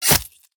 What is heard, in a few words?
flesh, combat weapons; Cosmic Rage